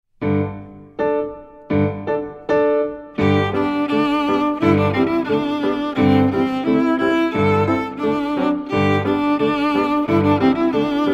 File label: russian dance